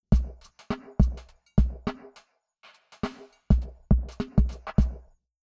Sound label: drums impulse 10m centre passage; Grange